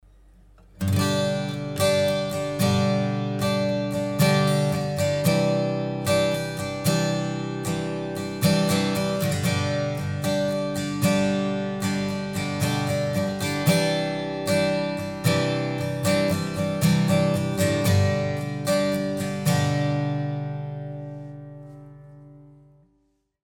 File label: stereo mid side miking